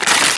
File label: rip, present; Spanish